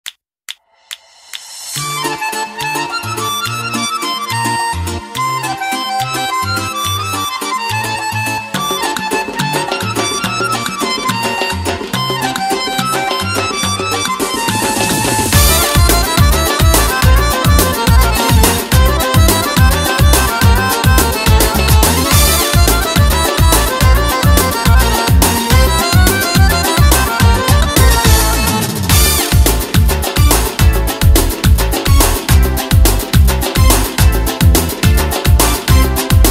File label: karaoke version